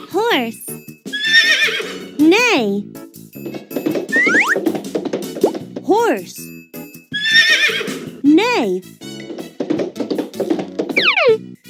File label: horse